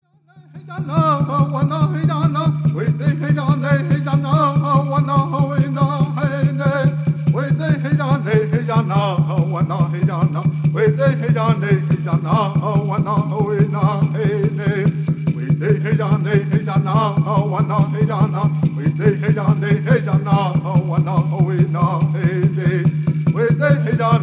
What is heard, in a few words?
Native American Church Song